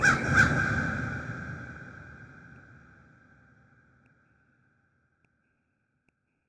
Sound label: Crow Hit